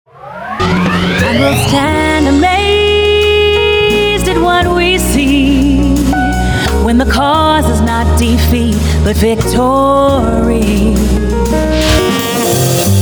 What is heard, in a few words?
Jazz Mix; cafe